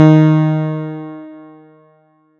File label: Piano, Harmonic